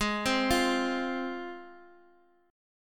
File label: G sharp 6th